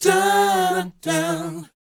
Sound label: BOYZ C#, Vocal Planet R&B & Dance; ILIO